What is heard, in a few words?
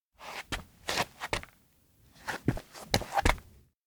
steps indoor town hall back step; anemoi パッケージ版 1.1